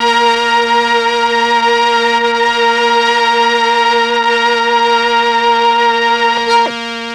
MEL STR, Legendary M400; ILIO; Propeller Island

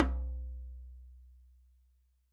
tom bas p, hand drums; SoundBytes - Early Patches

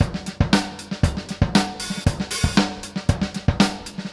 Beat Loops, 116; Zero-G - Monster Beats

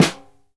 SNR, Synclavier Percussion 2 - World & Orchestral

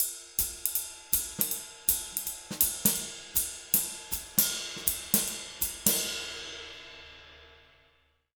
160 SWING, Jazz Latin Drumloops; USB Soundscan vol.08; AKAI

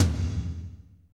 TOM, Drumscapes Roland

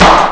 claps; Amiga Soundtracker Sample Packs

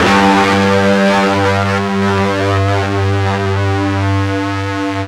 FUZZ G 1, Guitar; East West; Steve Stevens Guitar Samples Collection

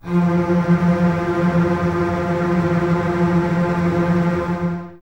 F 3, String Ensembles Basses Tremolo; Miroslav Vitous; 90 s Sample CDs

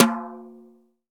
TOM, Drum 1 Dry; East Collexion

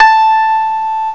TOY PIAN; CMI Sound Library Rev 1.3

